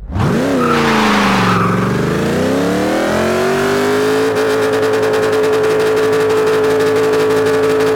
first, vehicles; 15charger

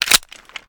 inspect xmag mag in; reloads; gmod

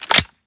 reload empty fast chamber; gmod; viper

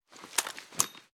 ar akilo47 raise first chamber; weapons; viper

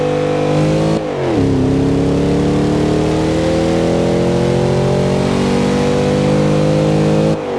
second, vehicles; cadescalade

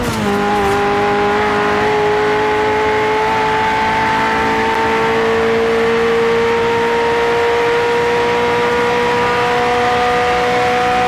fourth cruise, ferrari f40; vehicles

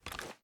reload empty fast drum start; gmod

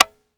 disconnector; gmod; viper

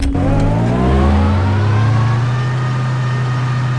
vehicle reverse

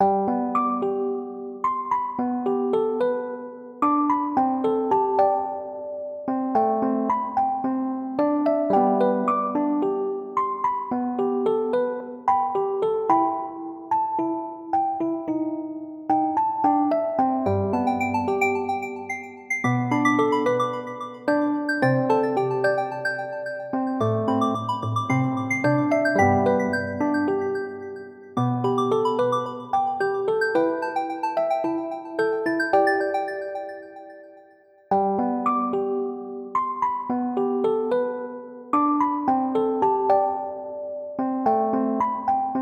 music box song; stardew valley ost

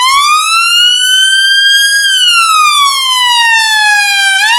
uk wail, siren; gmod; svmod

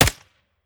sup; weapons; viper

